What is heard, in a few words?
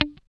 GUI D4, E-GUI MUTED; Sound & Vision; Gigapack I CD 2